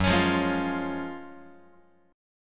F sharp Major 7th Suspended 2nd, F sharp, down, Guitar; strummed; JGuitar